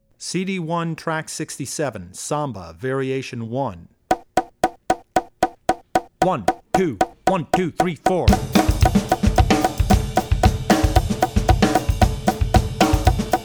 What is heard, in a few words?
Samba; Drummer's Bible Tracks